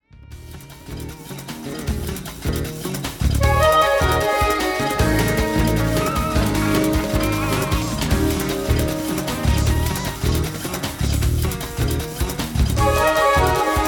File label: Gnawas Blues